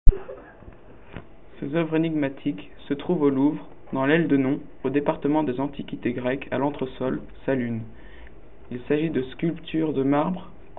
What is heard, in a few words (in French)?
Figurines violon